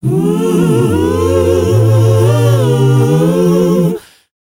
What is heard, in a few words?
mm choir, S. African world voices; ILIO; Vocal Planet